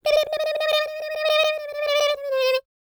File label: SCAT, Jazz